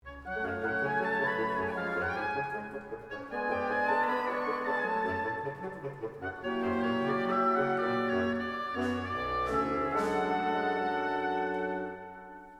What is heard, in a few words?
Mysterious Cheeky